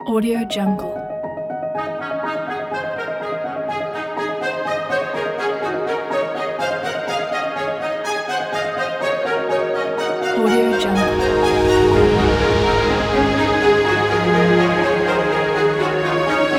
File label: the cinematic magic